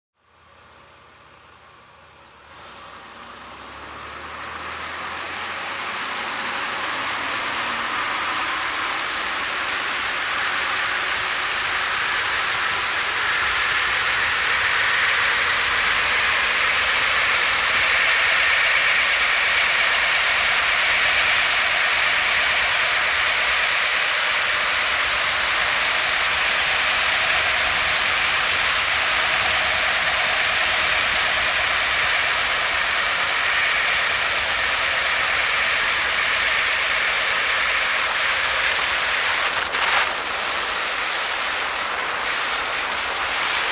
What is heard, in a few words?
Driving on Wet Road